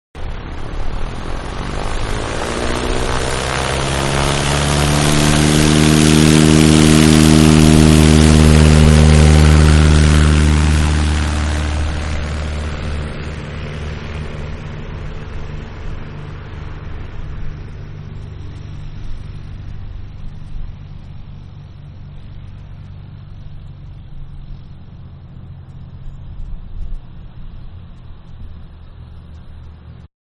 Airplane Twin Engine-Take-Off; Airplanes; Vehicles; Manhattan Production Music

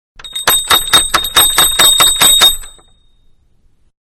Bell Knocker On Wood Door; Doorbells; Doors; Manhattan Production Music

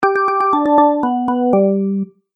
Organ Game Music; Cartoon Music Sound Effects

